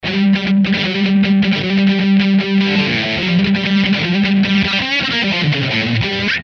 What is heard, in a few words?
Chorus Speed Rock G